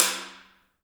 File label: HAIRCUTS, KENYA DRM, Heart of Africa